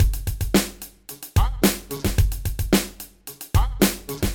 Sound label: Groove Lab Hip Hop